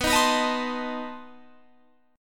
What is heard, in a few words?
B 7th Flat 9th B Guitar Open G